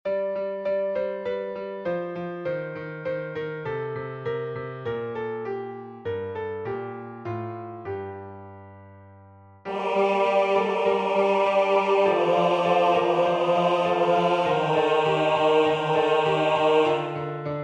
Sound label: Bass